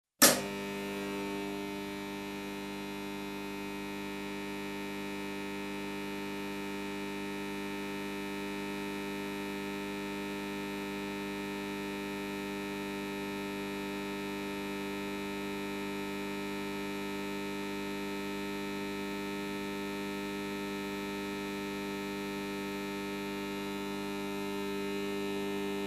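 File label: welding transformer sound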